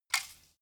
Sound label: bowstring shot